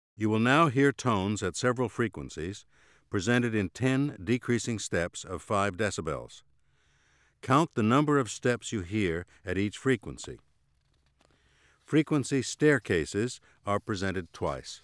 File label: hearing test tones